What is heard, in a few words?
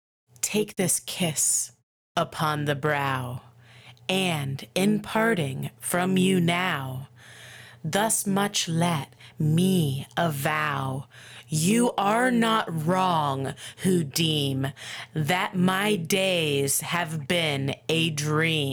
Vocals